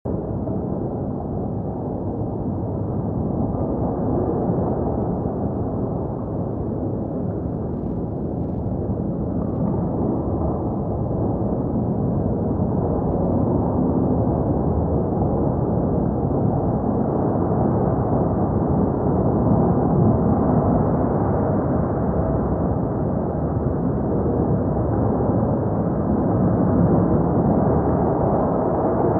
Synth Submarine Interior Reverberant Very Low Frequency; Electronic Sci-fi